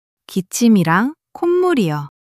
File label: struggle